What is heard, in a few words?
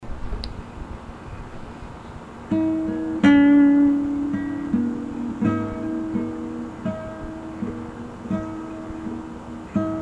Guitar